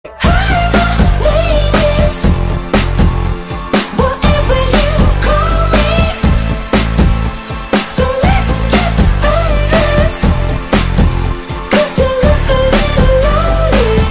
song ringtone

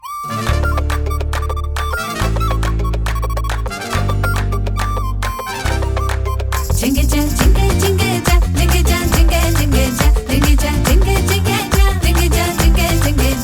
song ringtone, Tamil

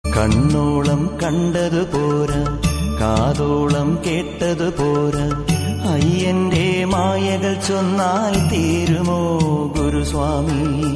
Ayyappa devotional song ringtone

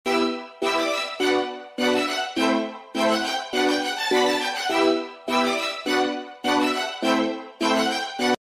instrumental ringtone

